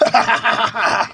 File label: laugh